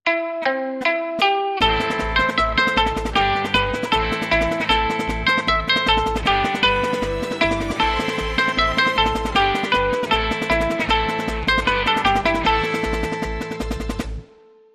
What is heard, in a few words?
Guitar